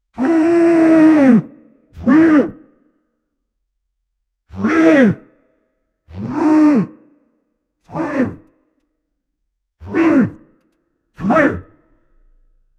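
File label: big male gorilla challeng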